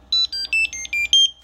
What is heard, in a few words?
Module Connection Successful - Six variable-tone beeps; Node + Module Sounds